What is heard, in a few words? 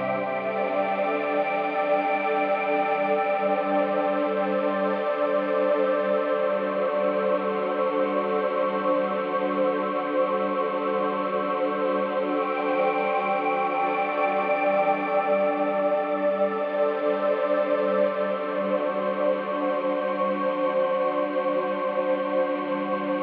music ambience; The Swapper; metaphysics